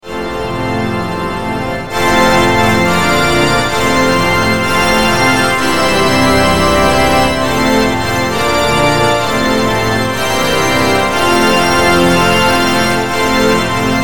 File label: Happy Birthday on the biggest pipe organ in the world 2,000 ranks